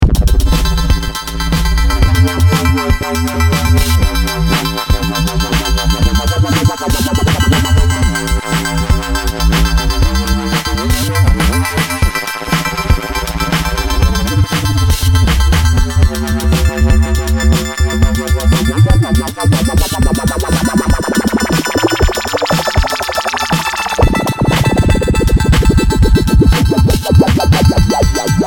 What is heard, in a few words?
du Blues